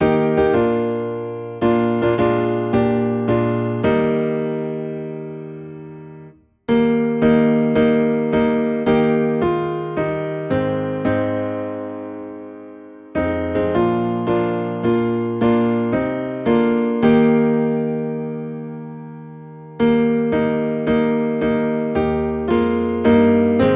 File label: Piano in E Flat